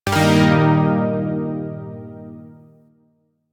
Shawzin Blues Chord H; Music; Warframe; Zariman Shawzin

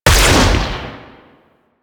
Shot, Weapons Magnum; Warframe; Tenno; Magnus Prime

